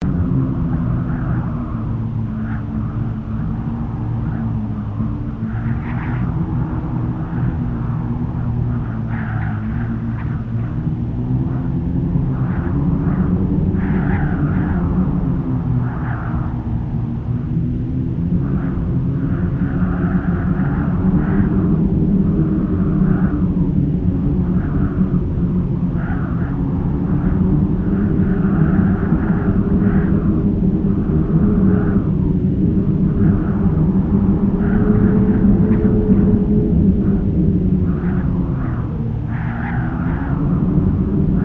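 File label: Wind Draft Breeze Window Loop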